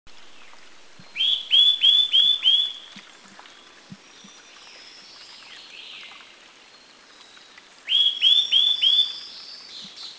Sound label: Green-backed Tit Blue Gate; Parus monticolus insperatus; Taiwan; fairly common and regularly encountered